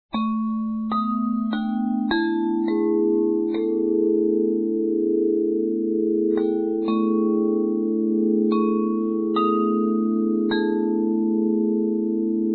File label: Bass